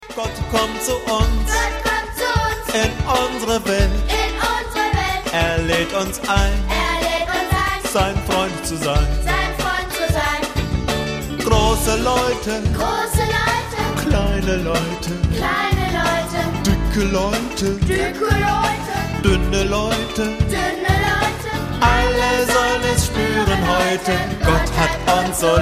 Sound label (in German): echo lied